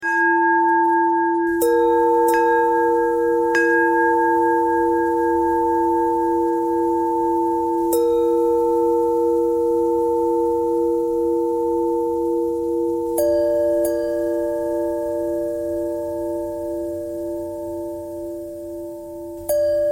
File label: bell thread bend thread